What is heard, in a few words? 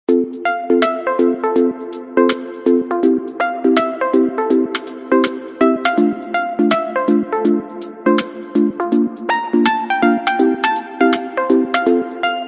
Balloon, Cheerful